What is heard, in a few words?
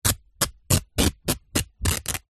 foley clothes cotton underpants rip tear